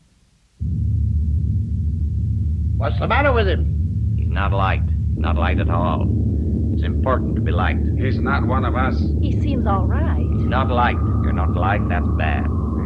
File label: Sirens, wind, treated voices, gong; The Man Who Collected Sounds